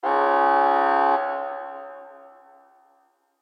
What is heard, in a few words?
Buzz; hidden deep windows gamerip 2022